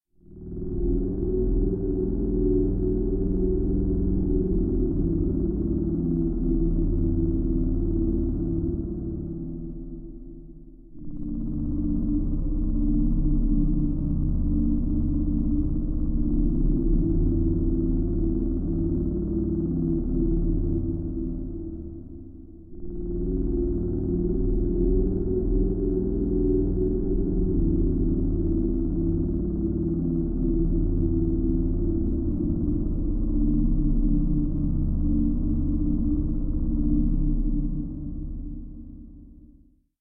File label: glow atmospheric background